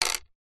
household jewelry bracelet carved bone set down wood